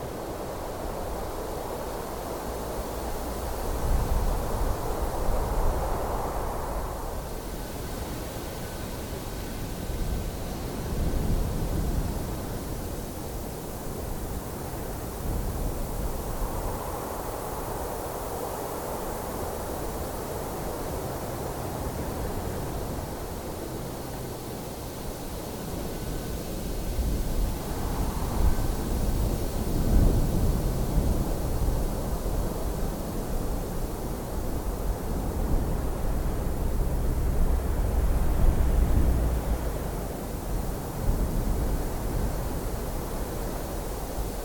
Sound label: Desert Level Ambience; Journey